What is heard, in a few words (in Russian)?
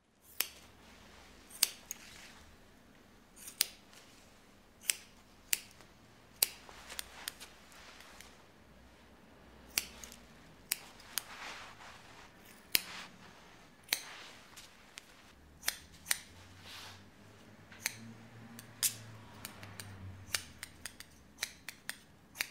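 стрижка волос ножницами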